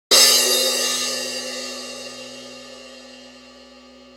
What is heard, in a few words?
rock crash 16 hit, cymbal; sabian